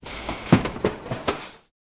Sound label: furniture